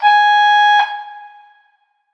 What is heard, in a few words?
alarm; 2fort